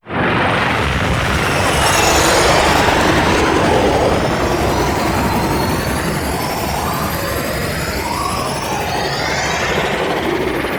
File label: Blue Sky Liset Relay Undocking, vehicles; Warframe; Liset Flight